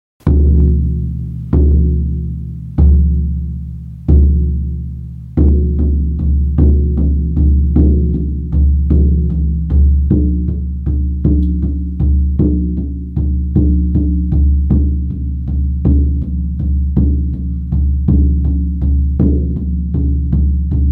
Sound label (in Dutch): geit, sjamanendrums